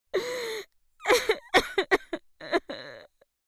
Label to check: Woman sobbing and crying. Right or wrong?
Right